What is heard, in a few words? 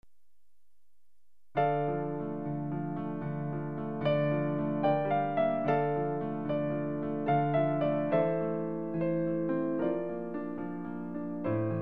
Piano